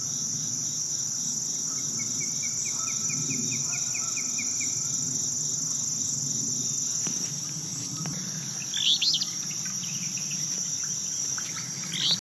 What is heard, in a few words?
Flycatcher